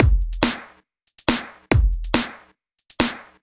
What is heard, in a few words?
Kick Loop, 140bpm